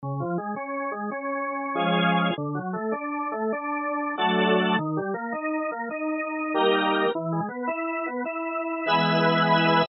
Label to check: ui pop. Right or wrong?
Wrong